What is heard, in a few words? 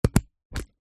stapler pocket sized single staple card; office